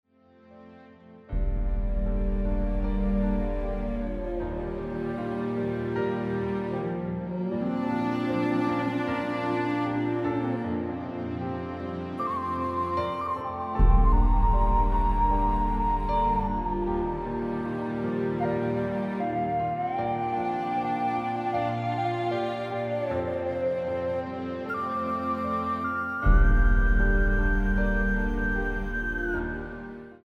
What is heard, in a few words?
Karaoke